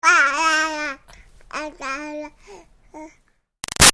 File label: Baby 6 Month Old Talk Short Answer; Babies; Voice